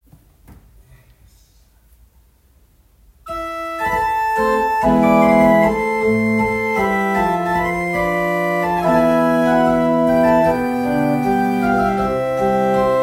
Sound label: Mayer, pipe organ